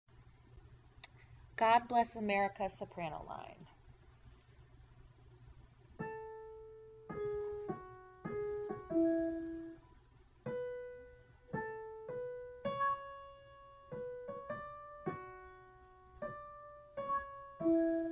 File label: soprano